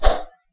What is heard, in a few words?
throw